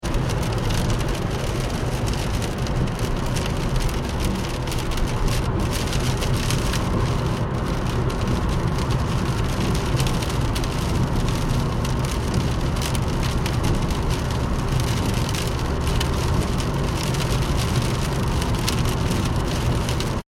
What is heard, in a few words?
transportation car driving 70mph internal rain ford focus; Car Driving 70 Mph In Rain - Internal Recording - Ford Focus; Cars; Vehicles; McKinney Sound